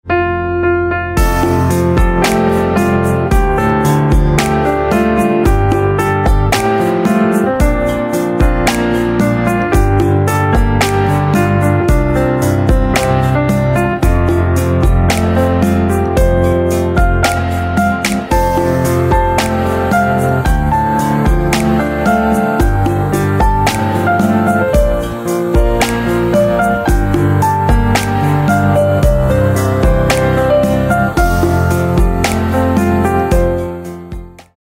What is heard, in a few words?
Piano